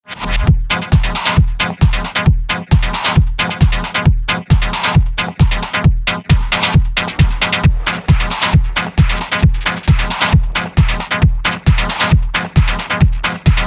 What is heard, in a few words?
White Noise Mix Dark Chamber DJ Mix